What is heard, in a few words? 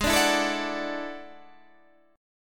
E 11th Ab